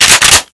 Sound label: spas pump, weapons